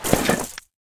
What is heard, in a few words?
armor; smite; god raijin